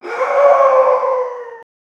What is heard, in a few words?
vocalizations